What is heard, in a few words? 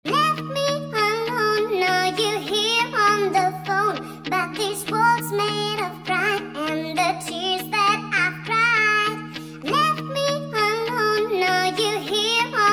Vocal